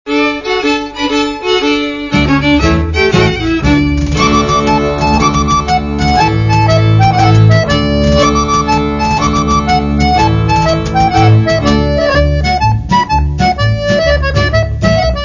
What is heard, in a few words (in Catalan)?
farandol; danses facils